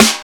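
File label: studio snare a key, tuned to the A note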